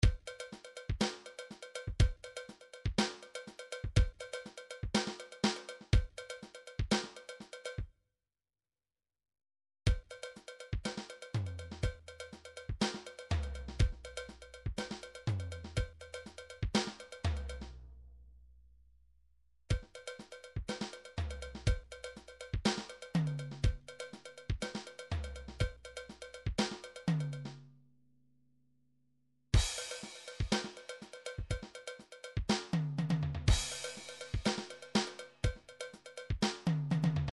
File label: drums